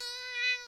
fox

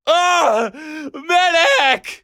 medic hurt; Voices Emotes

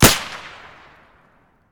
Rifle Suppressed, Guns Fire

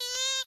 fox; Animals

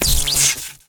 defib zap; Items

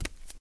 wood barefoot, Footsteps

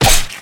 alien claw flesh; Weapons; Xeno

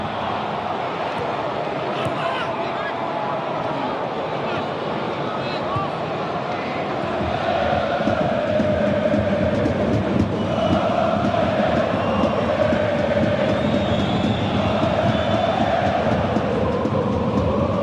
football, Ambience

